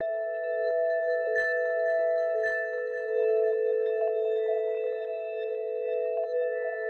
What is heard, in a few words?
big ball loop; aronwk collection; ndaudio